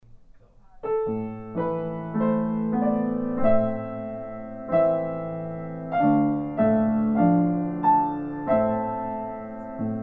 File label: 500 Year Old Melody